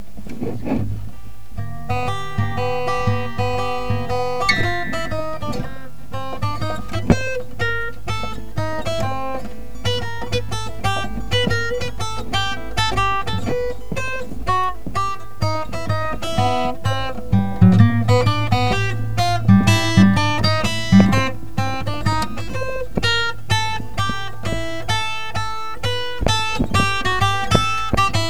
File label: Acoustic Guitar